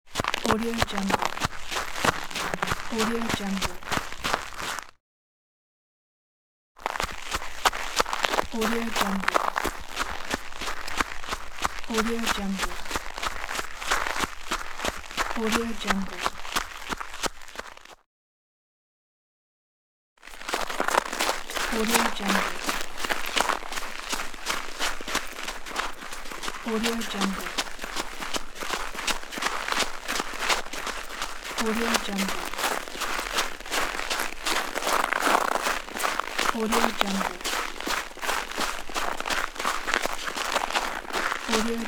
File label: run on snowy ice